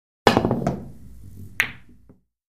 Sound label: Billiards Ball Spin